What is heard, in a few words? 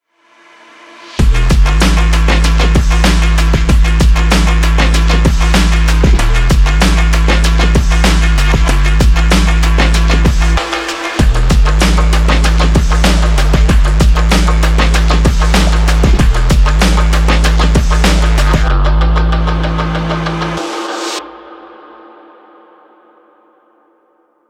urban speed